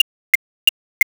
ticktock

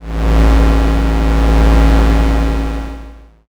space engine

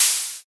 open hat

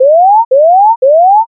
scan

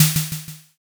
snare

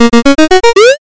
picoquestion

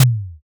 taiko

